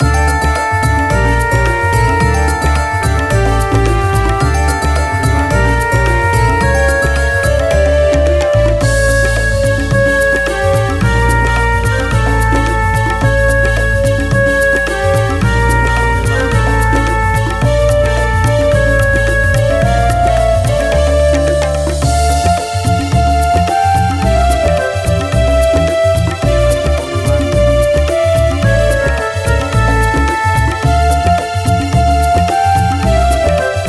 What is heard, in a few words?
Arabian Music Bed